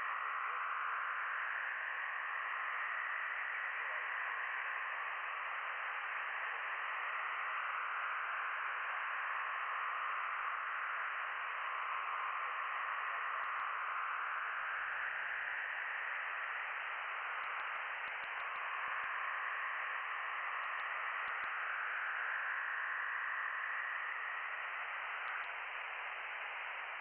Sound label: from radio, 7177KHz